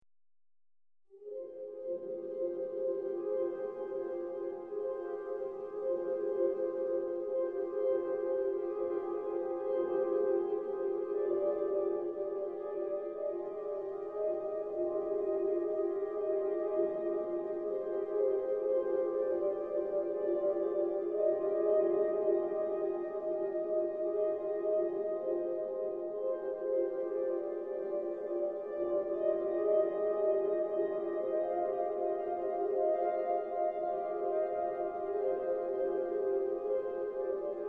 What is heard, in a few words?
eerie; eerie guitar